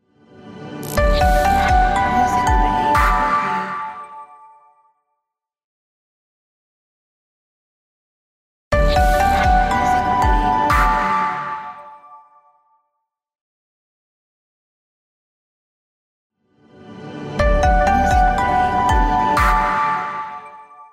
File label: Reveal Simple Glitchy Logo